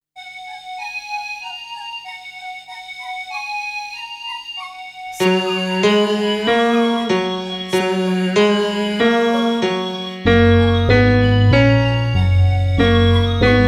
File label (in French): VERSION KARAOKE; Alex et Zoé 1